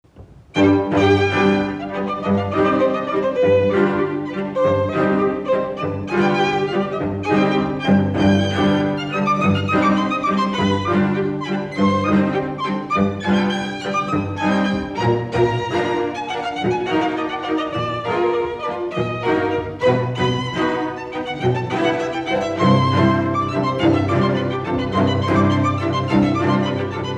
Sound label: Quintet in C Major Op. 163 IV. Allegretto Piu allegro; Schubert